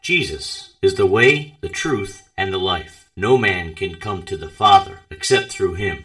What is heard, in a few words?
gospel